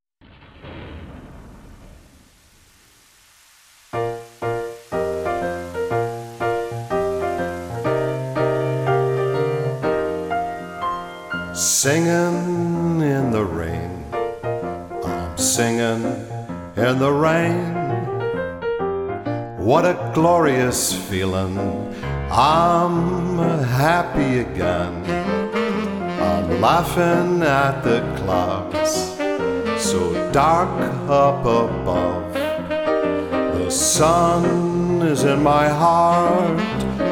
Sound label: Tribute Show, Sax Band